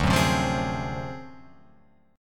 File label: D 7th Sharp 9th Flat 5th, Guitar Open F; D7#9b5 strummed; JGuitar